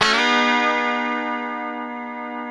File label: A 3D FUNK CHD, Guitars; Spectrasonics; Hans Zimmer Guitars VOL-1; FUNK CHORDS